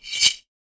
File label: Pasta Jar, household rhythm samples Hits